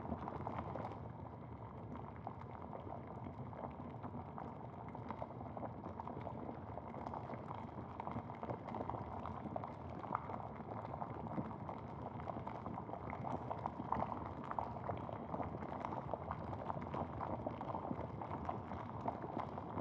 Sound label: bubbling heavy